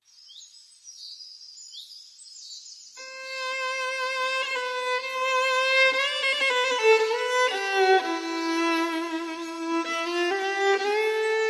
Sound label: Acoustic